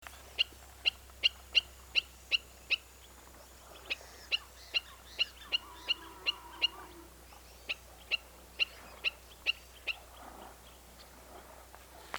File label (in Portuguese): canto da galinha dagua carijo